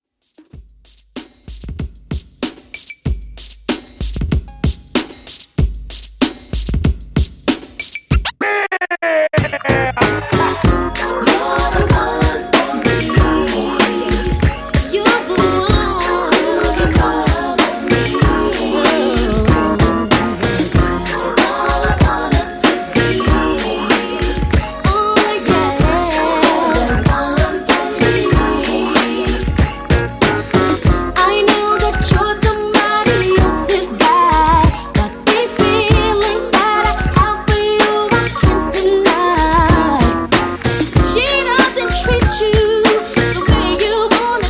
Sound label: 90s Hip Hop, 95 Bpm; ReDrum